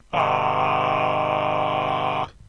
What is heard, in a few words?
M4 major